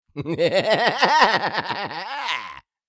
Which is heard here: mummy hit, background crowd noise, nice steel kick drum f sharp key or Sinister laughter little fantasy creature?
Sinister laughter little fantasy creature